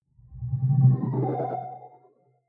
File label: sci-fi display transmission damped